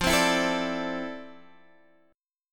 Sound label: F Major 13th, Guitar, Drop D; down; chord shape